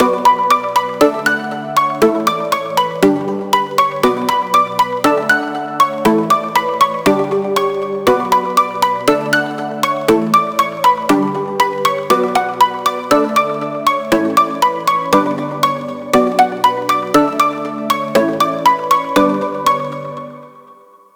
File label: EDM Pop Melody Energy 119BPM; nikos midi pack